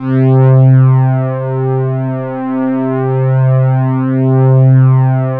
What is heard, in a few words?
C2, ANALO STRING; Sound Gallery - Charged